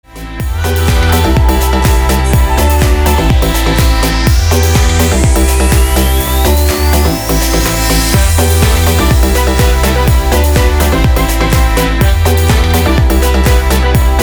instrumental club mix

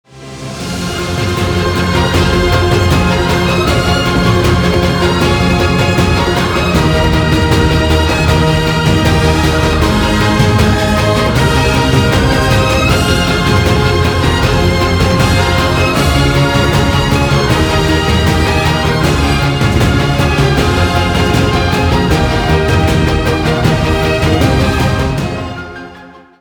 epic music

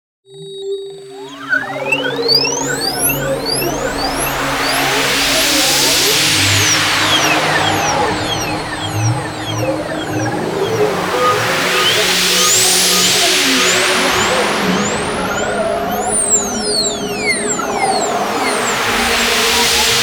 cosmic noise

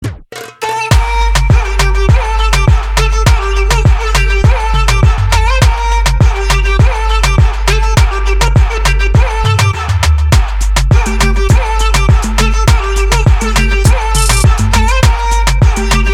synth

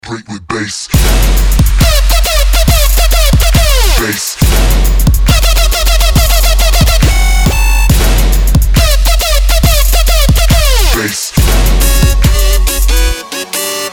trap remix

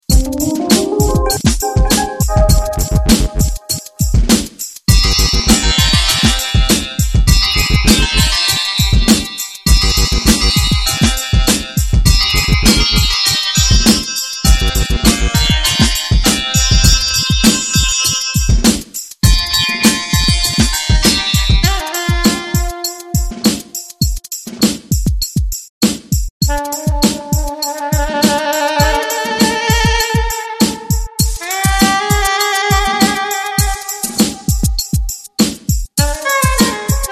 hip hop

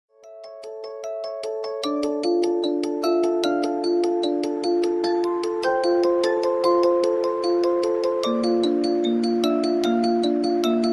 dubstep remix

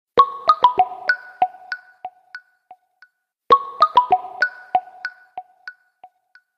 huawei ascend p8 bounce

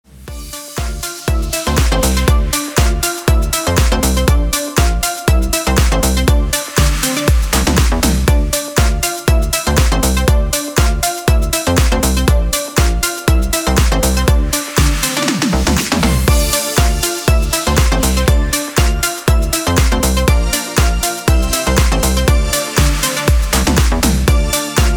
backing vocal mix

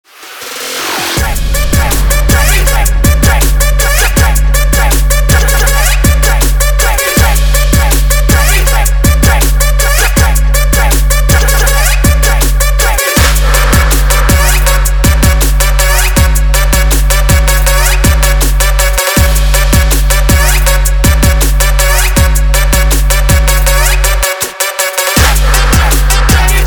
EDM trap

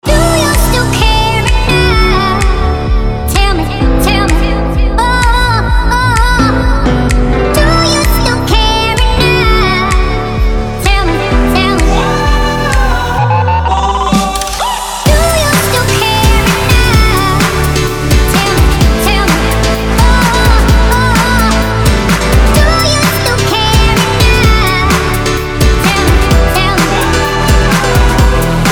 funk machine

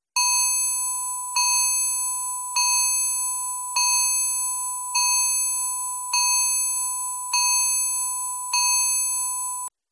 samsung tone x140 standartnyy rington

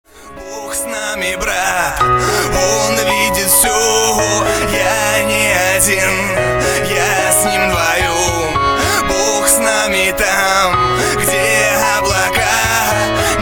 bass

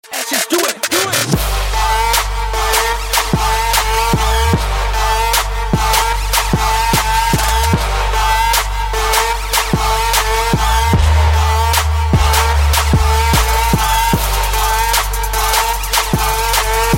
808 breakdown

